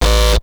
Alt Fire Growl, Infested, Arm Cannon; weapons; Warframe; Coda Bubonico

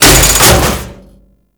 Loot Crate Open; Warframe

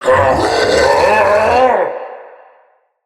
Fearful Cry; Battle; Mendicant King

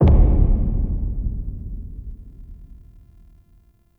Sub Hit; Ambience; Warframe; Shadowgrapher

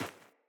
Sandals Run Rock, Footsteps

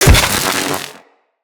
Electro Prod Hit Flesh; Weapons

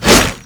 Whoosh, Weapons; Infested; Coda Caustacyst

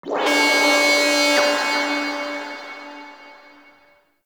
Capital Ship Alarm; The New War; Ambience; Mission Two

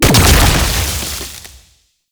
Safe Zone Item Player Explosion; Gameplay; Warframe; Nineteeen Ninety Nine; Deep Archimedia

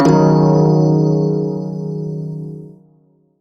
Shawzin Yo Chord; Warframe; Prime Shawzin

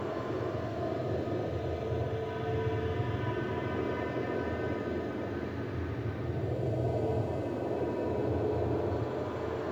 Back Engine Room Amb; Railjack

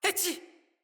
Sneeze; Fable Of Garren Rood; Avakot

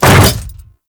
Hammer Boss Step Default, Footsteps; Warframe; Grineer Hammer Boss

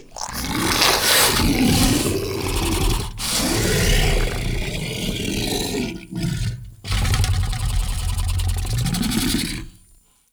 Ancient Tank Idle, Enemies, Infested, Barks; Warframe

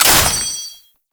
Exalted Sniper Last Shot; Warframe; Frumentarius

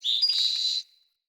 Fey Flock Attack Dive; Powers

